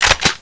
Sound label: bolt, weapons; dod